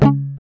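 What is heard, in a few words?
GUI C#, acoustic guitar; Best Service Gigapack 2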